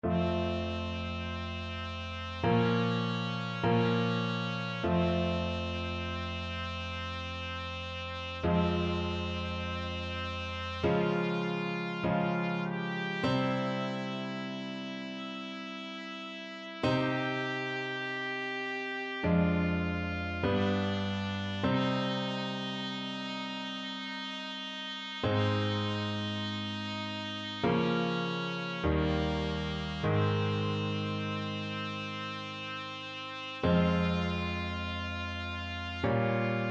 trumpet

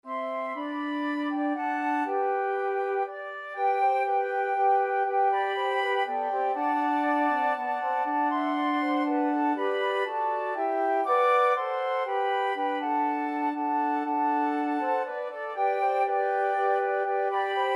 flute quartet

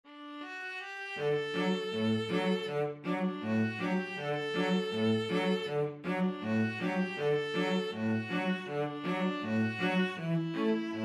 when the saints, string trio

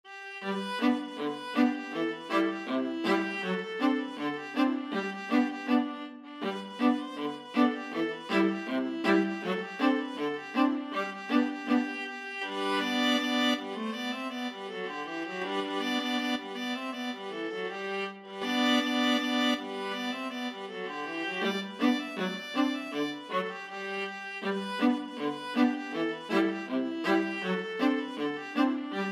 viola duet

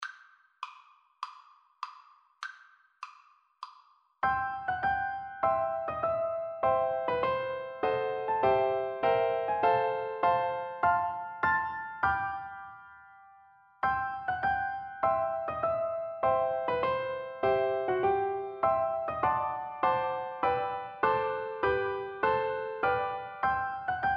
piano duet

